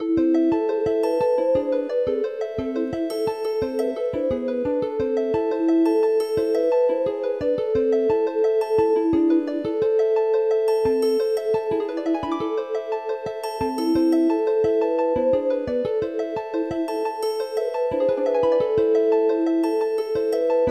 Deep sea drifting; PIRIKA PIRIKA

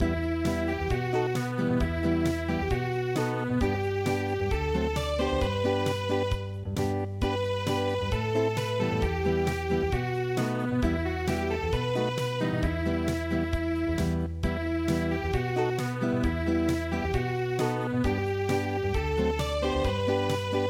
Lullaby Unplugged; midi; traditional

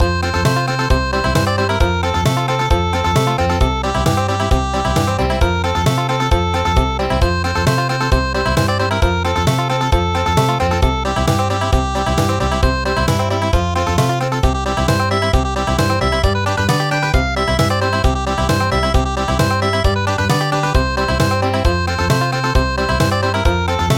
Polka Country; Helena Polka

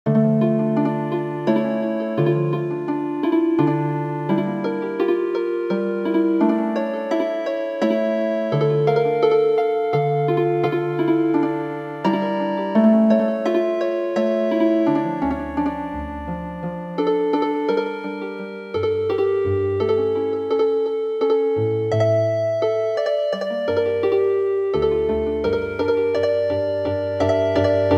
Healin Harp; RUSTLE OF THE GREEN GRASS

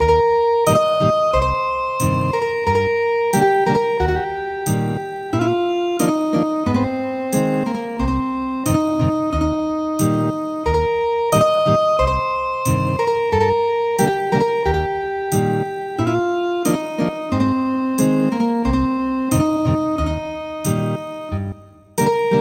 Ethnic Guitar, traditional

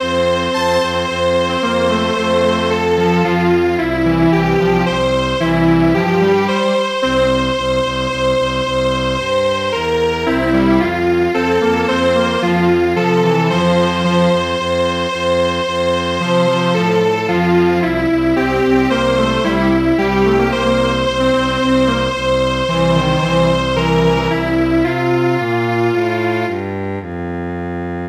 Strings Quartet, traditional

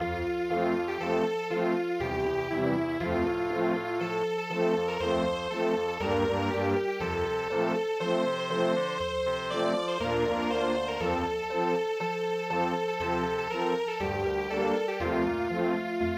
vienna waltz

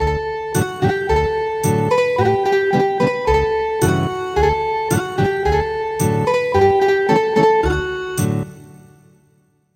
Ethnic Guitar

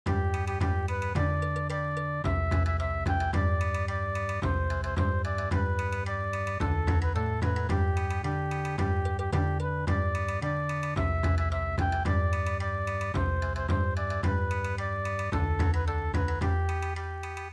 Bon Odori

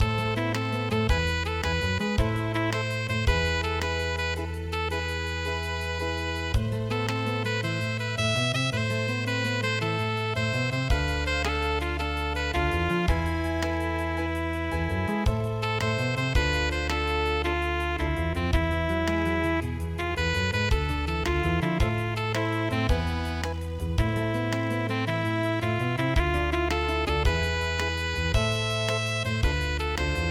tokyo ondo enka

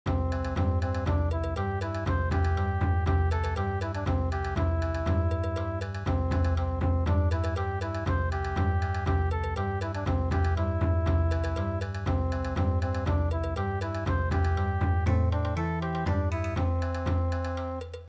Bon Odori; gondola song

